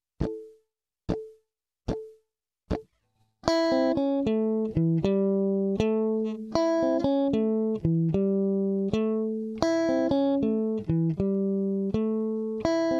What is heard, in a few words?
blues lick, guitar